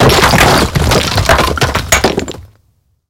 building fall mid; tanks; War Thunder

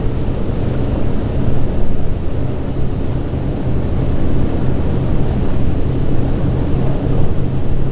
c46 cockpit r, engines; War Thunder

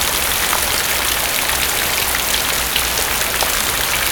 waterfall closed 3d

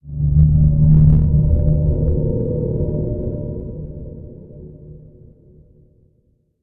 ambient port; World Of Warships; port3d

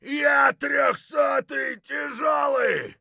hurt heavy; Escape from Tarkov

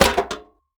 window embrasure deploy; Rust

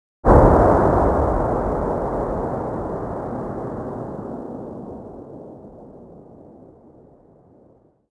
expl far tail, explosion; World of Tanks Blitz

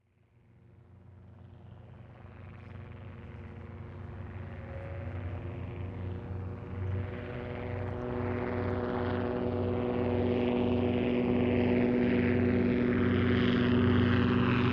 combat atmosphere; World of Tanks

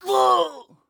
hit; Escape from Tarkov; scav1

